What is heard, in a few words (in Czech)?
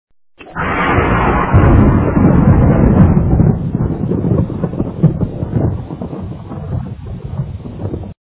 hrom